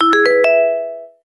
Notifier Contentment, BlackBerry 9000 Bold

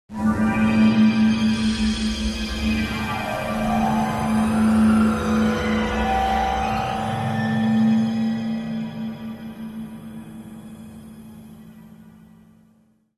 Underwatercall, phonetones; Sony Ericsson; PlusFourSix; Thomas Tibert

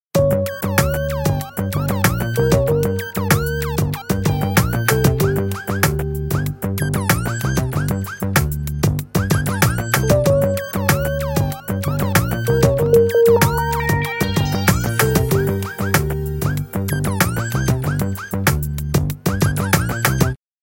Gritty, Nokia Tones

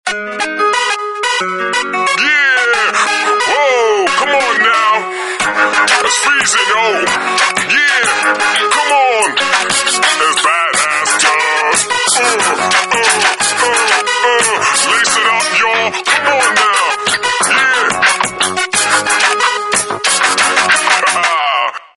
Hip hop guy; Samsung GT-S5500H

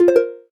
gayageum, keytone; SKY IM-A760S-VEGA-Racer

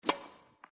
camera shutter, Sony Ericsson; Z310i

